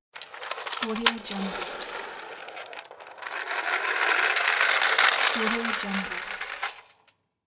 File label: toy car rolls